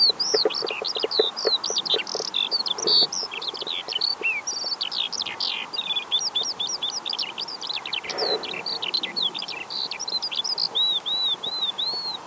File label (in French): alouette des champs; fresnes sm